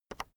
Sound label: keyboard external enter release soft